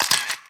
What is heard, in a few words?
photo Snap Sound